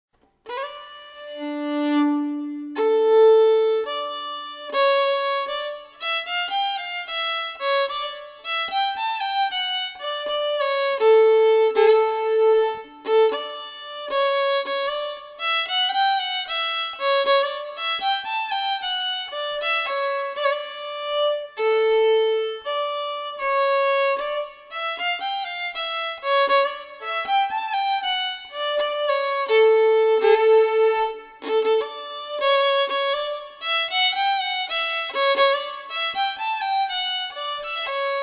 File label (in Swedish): polska efter slow